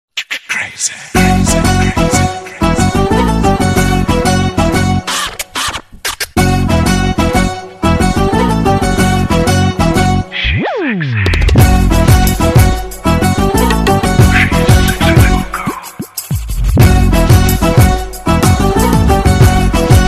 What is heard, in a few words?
arabic ringtone